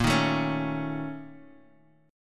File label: A sharp Minor Major 7th Double Flat 5th; strummed; 18,18,19,18; JGuitar